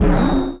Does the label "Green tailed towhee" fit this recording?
No